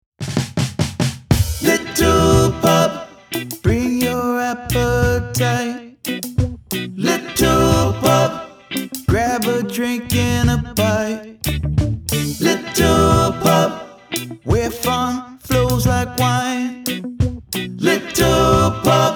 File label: Jingle Reggae